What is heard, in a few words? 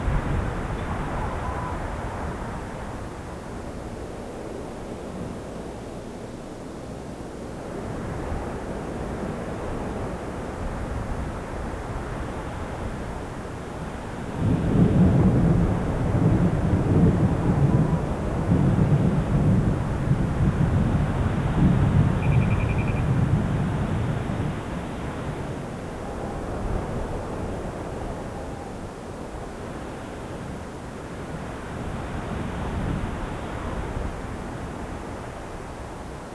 firing range, ambience; cstrike